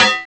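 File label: PERCUSSION METAL, Techno Perc; Roland L-CD701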